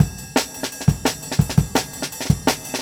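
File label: Jungle Breaks, 170 bpm; Farther; Original Jungle Breaks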